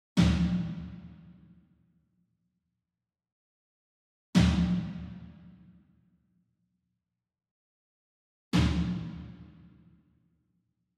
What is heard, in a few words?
SINGLE DRUM Tom Concert Hit Hard Beater Far; boom library; Cinematic Strikes Construction Kit